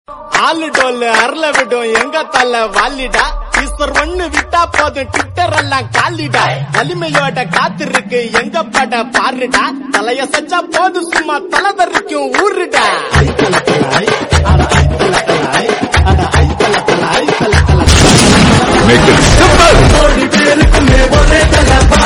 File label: song, bgm; thala; valimai